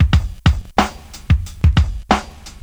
Drum loops, Boombap

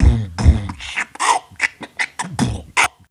Beatbox; Drum loops

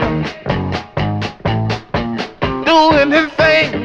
guitar and voice, Phonky Loops

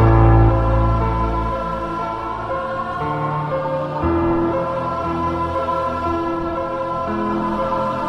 piano and keys, Sad Loops

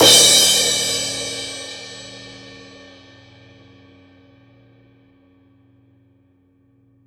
Cymbals

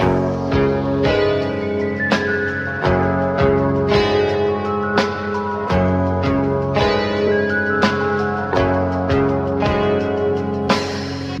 guitar and keys, Phonky Loops

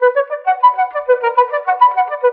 flute, Jazzmatazz loops

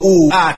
"ooh ahh" male

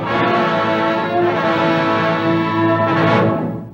drama fanfare

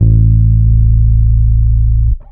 dubber, Bass; Notes

